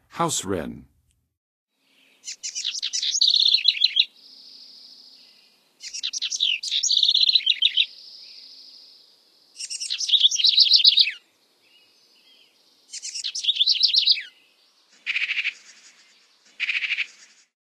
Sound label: House Wren, Songs of Birds; North America; Western USA; Western USA Songbirds